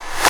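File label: Clap reverse, right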